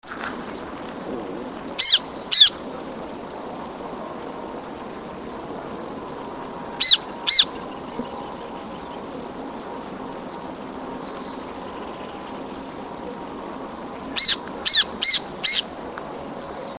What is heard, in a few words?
Hornero